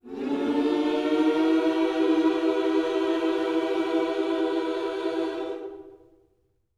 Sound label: MM MINOR, Gospel